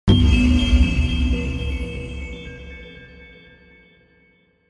sound design production element hit data tone dark